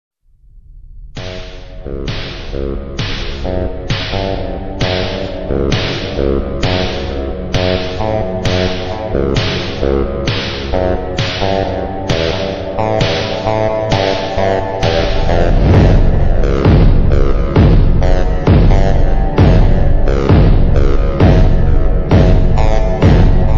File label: ultra slowed